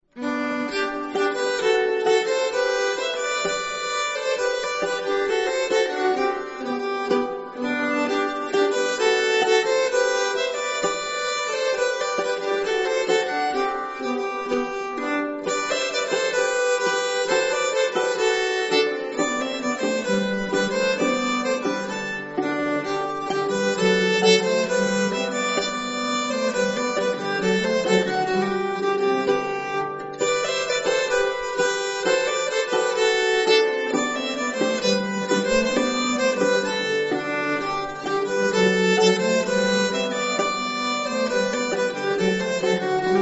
schots menuet, nyckelharpa

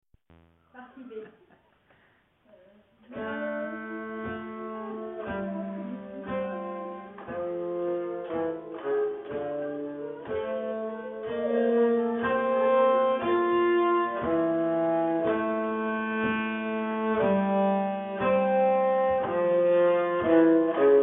polska, nyckelharpa; polska Blomgren

